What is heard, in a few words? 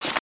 Card sort; DSi Casino